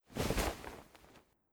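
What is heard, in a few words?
Ara Parrot move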